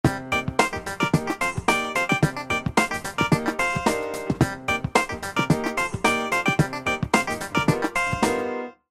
funky ringtone, nokia ringtones

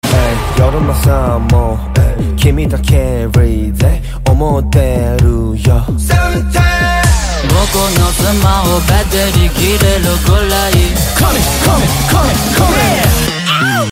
cool rock musical song tone ringtone

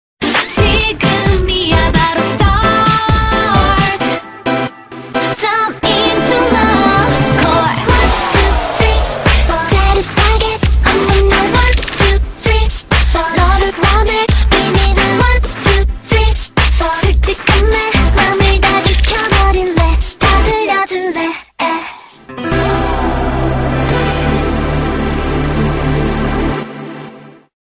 pop pop hit ringtone

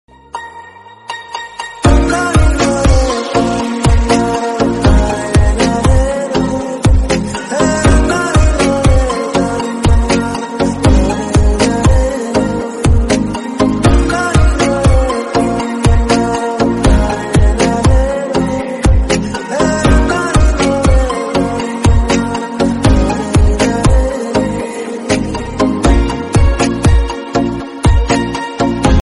lovely background music cool tone

